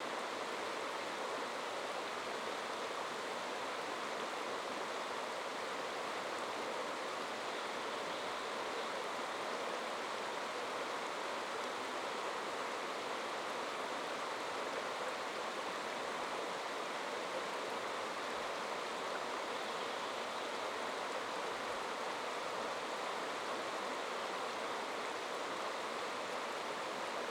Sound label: noise; Mindboost